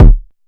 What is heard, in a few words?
heavy kick